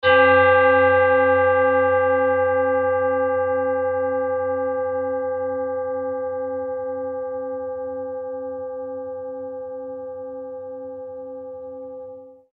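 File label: tibetan bowl jupiterset, C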